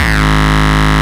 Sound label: Bass, Root G#; Vengeance Samples